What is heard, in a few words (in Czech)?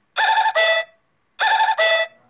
melodie